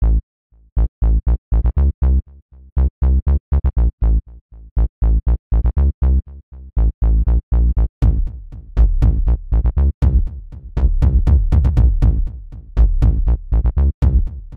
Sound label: 2 beats per second